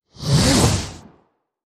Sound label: Light Attack; wild worlds